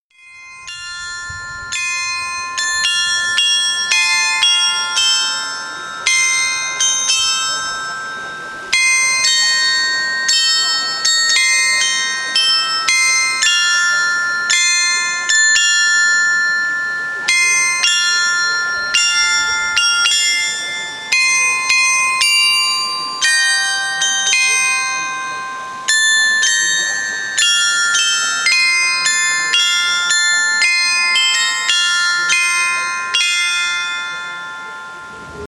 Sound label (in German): glockenspiel lied; Das Alfeld-Lied